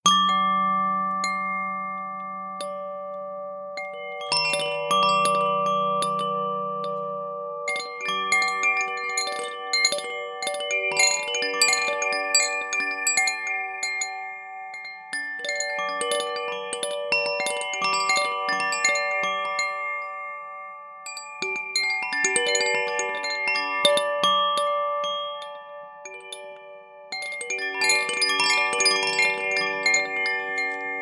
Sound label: spring wind chimes crystal sounds